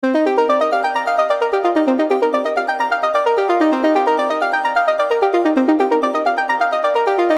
Infographic call ringing tone loop

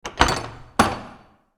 Heavy cottage door open

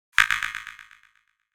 Hologram interface tiny glitch

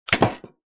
Bolt rifle empty mag drop; assault rifle

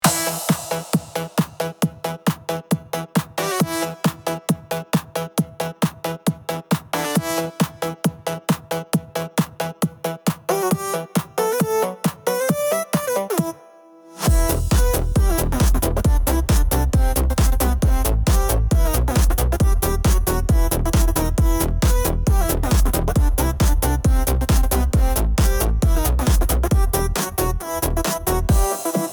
Gaming uptempo

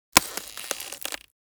Ice crunch and crack

